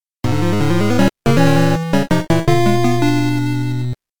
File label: Fanfare! Move Delete; Pokemon OST Collection; Pokemon Gold Silver Crystal OST